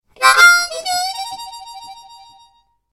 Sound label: sms harmonica ringtone